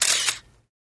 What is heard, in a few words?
35mm Camera Auto Win; shutters & movement